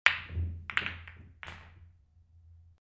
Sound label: Snow Ghost Pool